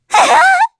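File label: Vox Damage, Korean; Pansirone; kingsraid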